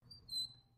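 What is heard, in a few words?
Front Door Hinge Squeak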